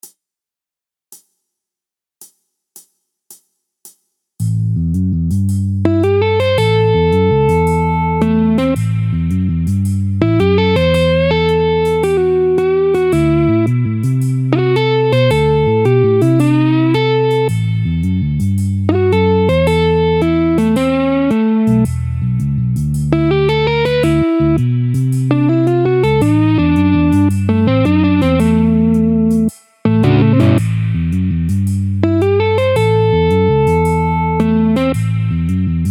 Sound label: blues solo, guitar; teiban